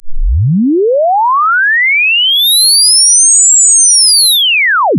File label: fm double sweep